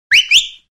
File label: whistle; CosmicRage